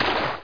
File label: water run; HereticII Demo; Player